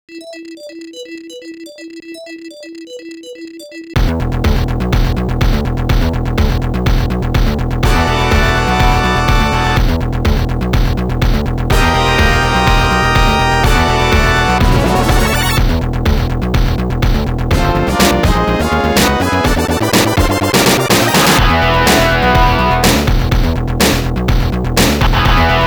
DEMO without VOICE; Rabio Lepus; Turbo Force; Video System Arcade Sound Digital Collection Vol.2

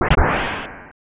Beam

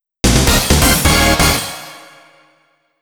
Collect a Reward; Ginga Force

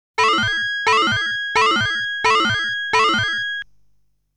Time warning tone; Legend of Hero Tonma

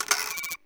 Inspect Open; in-game sound effects; Papers Please; android ios windows gamerip 2013

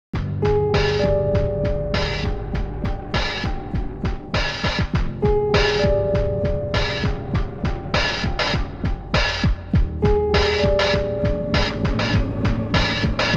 On Alert

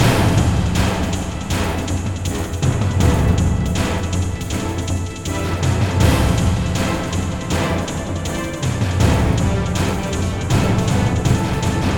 Boss Bkgd; Timez Attack

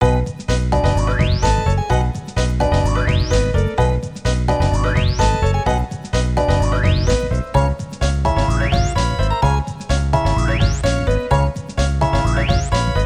Bonus Level; Glover

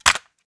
Cassette Rattle

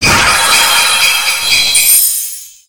Dealer; Zenonzard; Android

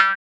Rabbit run

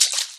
footstep water; Scrat

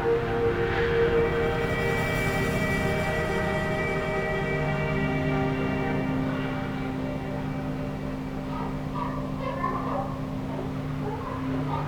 Ambient Desert Loop Stereo

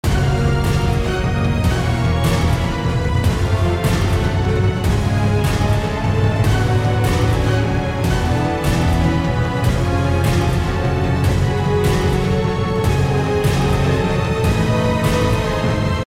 Battle Loop; Infinity Blade 2

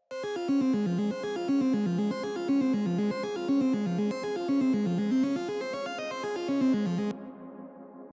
Red Fog Analog Soft; Get Even

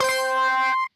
Race Count Start; Pitch-1; Timberman VS; ps4

